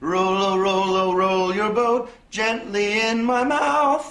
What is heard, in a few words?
rollo rollo roll your boat